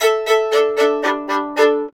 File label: FIDDLE FX; Sampleheads; Whole Lotta Country 1 & 2